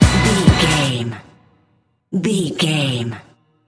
sting, Dance, EDM, Club, Disco, Eurodance, Confident, Energetic, Cheerful; Exercise, Aerobic, Aerobics, Spinning, Workout